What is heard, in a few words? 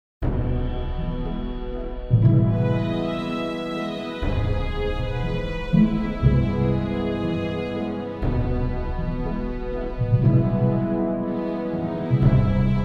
orchestral jingle